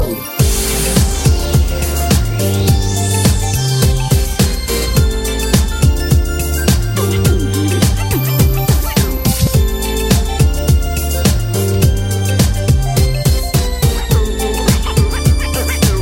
candy pop

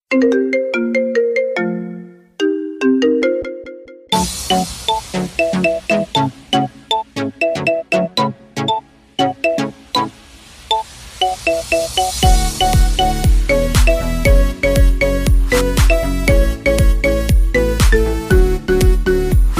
solo dance marimba

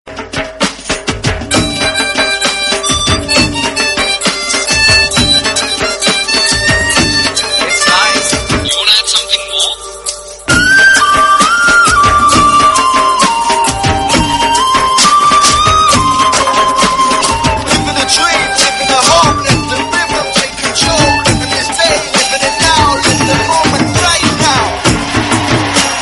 mobile ringtone; airtel

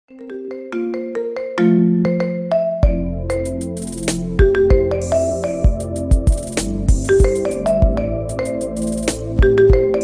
marimba ringtone remix

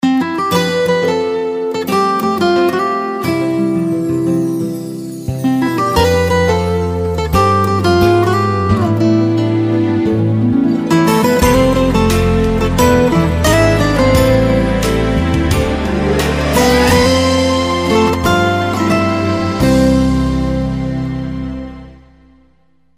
beautiful instrumental music ringtone

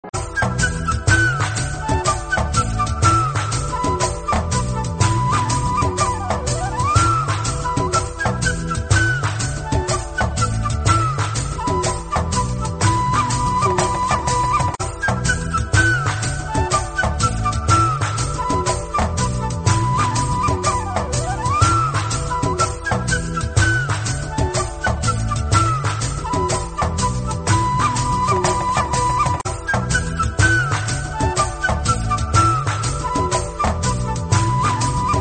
flute

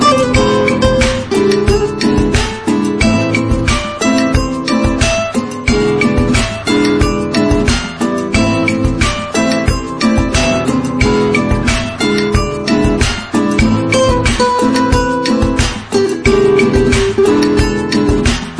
romantic

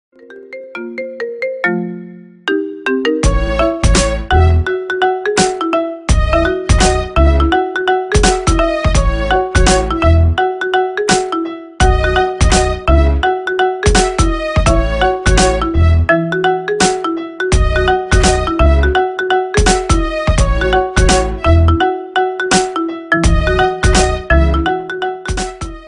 marimba ringtone remix

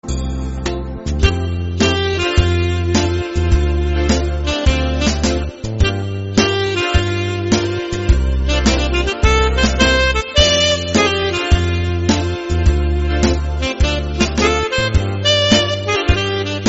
old hindi instrumental

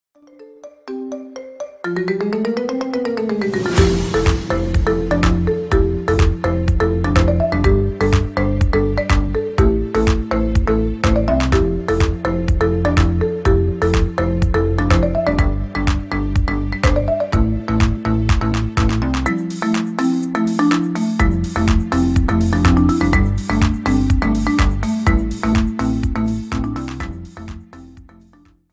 marimba ringtone remix

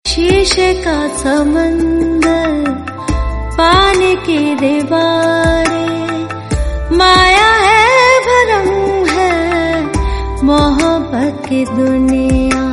best sad ring ton

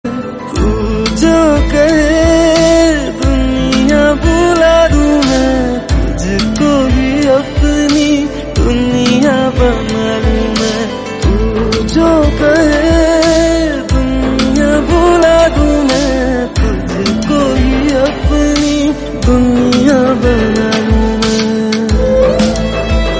hindi ringtone

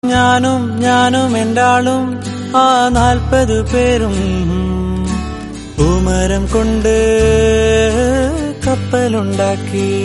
song ring tone

